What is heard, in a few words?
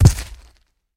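Rock Dirt Heavy Dead Thud; rocks & dirt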